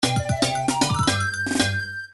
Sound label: jungle goal; super nintendo; donkey kong country 2